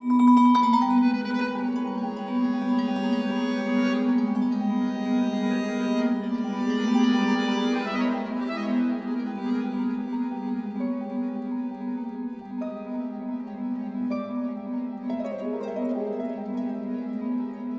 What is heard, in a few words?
audio trio refuge